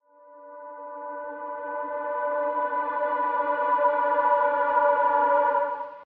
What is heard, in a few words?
Amb Night Music Intro; Fortnitemares